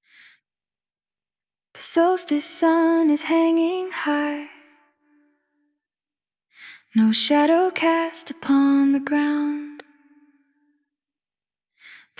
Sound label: vocals